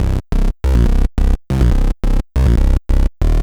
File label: Trance Bassloop 140bpm, Bass Arps; Zero-G Trance Inducer; 90 s Sample CDs